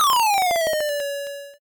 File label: gameover dark